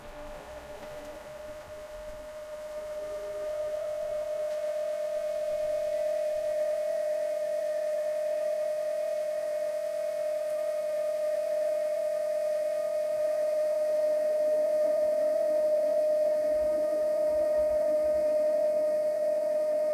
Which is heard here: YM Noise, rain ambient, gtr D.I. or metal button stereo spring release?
rain ambient